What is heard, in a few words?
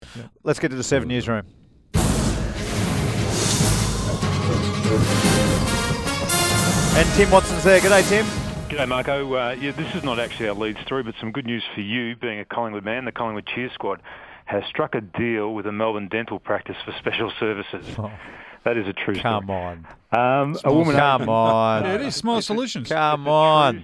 Collingwood Cheer Squad